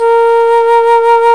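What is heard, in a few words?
Alto Flute vibrato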